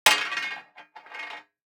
DICE on Metal, Throw and Roll, Standard, 1 One Die; Samples; ArticulatedSounds; DICE Sfx Party (Extended)